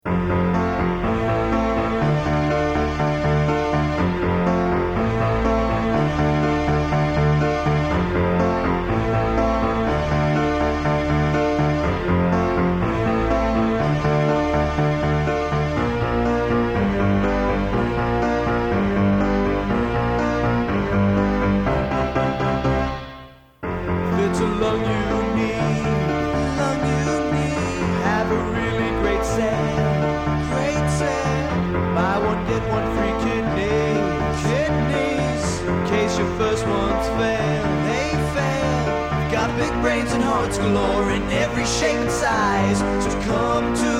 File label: Organ Man; theater